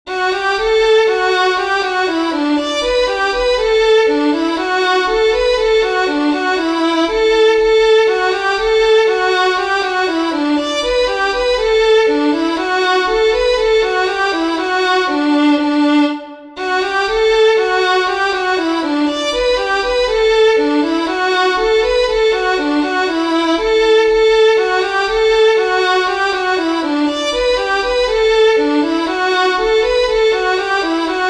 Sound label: MAURICE OSHEAS MARCH, fiddlers; southern kings fiddlers